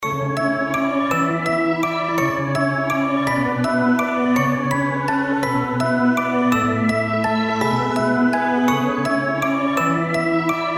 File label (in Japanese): ファンタジー系オルゴール